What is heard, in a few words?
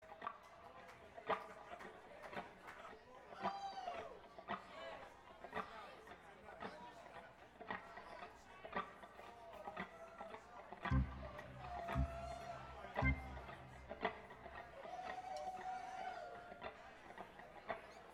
tuning